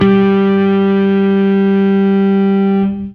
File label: Fuzz G harm